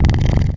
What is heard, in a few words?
Purr End; talking tom cat 2 android ios online gamerip 2011